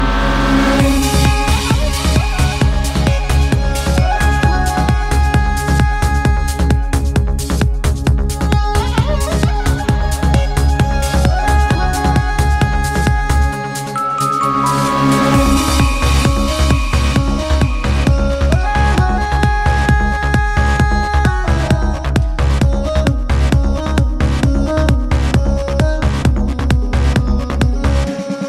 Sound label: music ringtone, bollywood ringtones